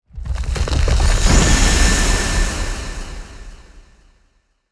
Spear; Yu-Gi-Oh! Master Duel

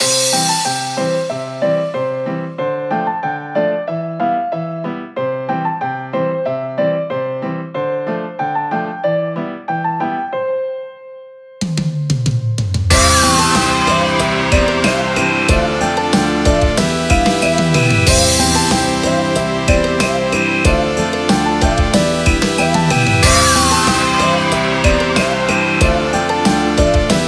Pause Menu; Friday Night Funkin' Tails Dark Diary

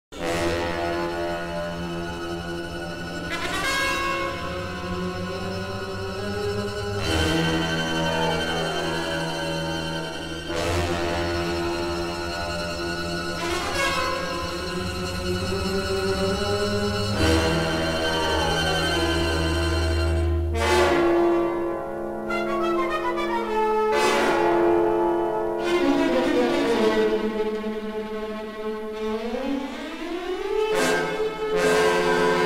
BGM Horror; Family Guy Back to the Multiverse

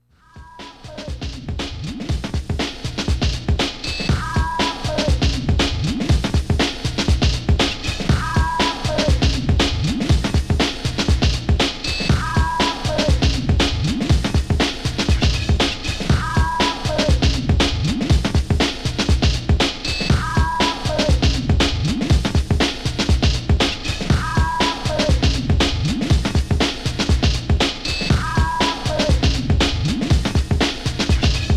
menu song

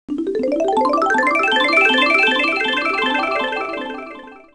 end level; 3D Spins

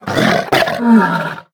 Cat Lose Battle; mighty party 2017 gamerip pc ios android

